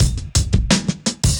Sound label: Beat Style, 170bpm, breaks